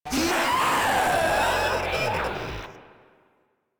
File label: Proto Infested vocal attack